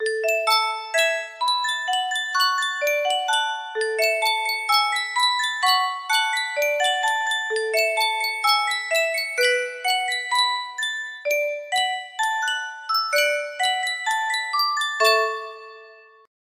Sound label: music box